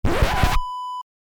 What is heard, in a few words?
record scratch